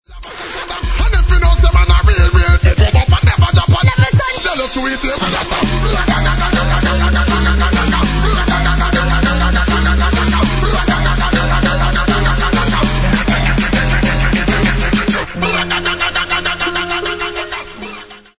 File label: ape drums; kenzo spiegel